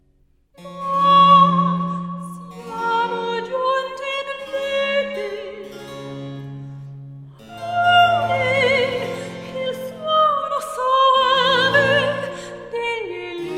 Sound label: Cantata - Recitativo; Il Delirio Amoroso; George Frideric Handel